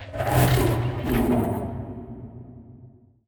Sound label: void worms juvenile attack; Stellaris; grand archive; ships